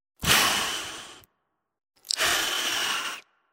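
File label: sound of cat hissing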